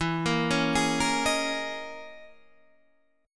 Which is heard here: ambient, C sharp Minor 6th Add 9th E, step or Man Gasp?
C sharp Minor 6th Add 9th E